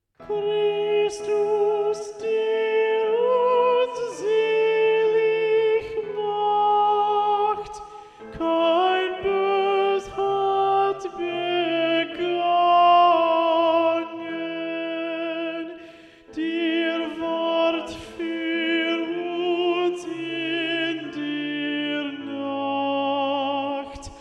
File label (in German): Christus der uns selig macht Alto